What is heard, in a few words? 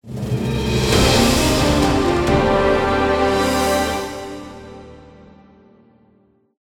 RESULT VICTORY; Titan Slayer II